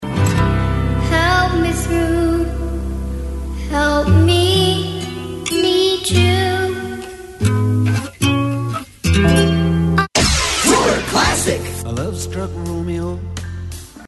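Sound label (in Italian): errore MIX OUT in Refrain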